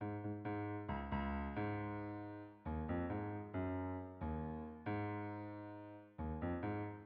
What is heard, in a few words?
piano